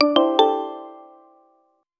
soft non intrusi, airy

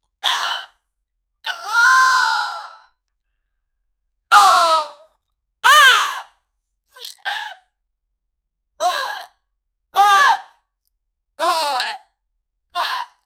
woman beaten and stoned

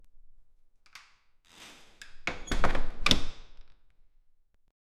leaving house door click; urban environment

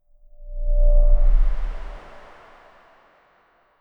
a gentle swoosh; ui

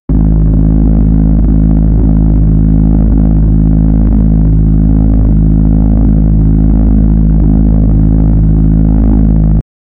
ship engine old

